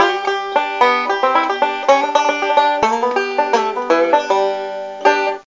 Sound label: Banjo; kentei kyoukai imasara hito ni wa kikenai otona no joushiki ryoku training ds